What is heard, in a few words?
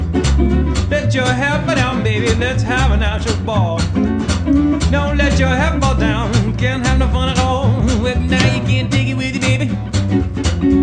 blues